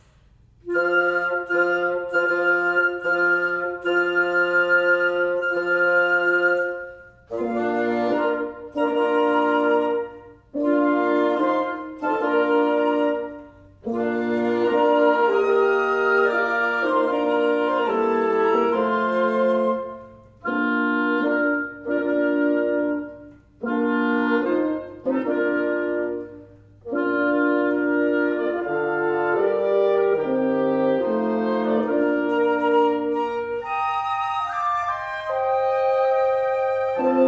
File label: Bridal Chorus; from Lohengrin; Recessional Music